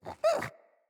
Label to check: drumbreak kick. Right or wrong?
Wrong